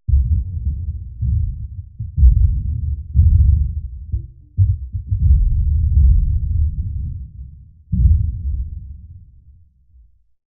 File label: Explosion, 5.1 Surround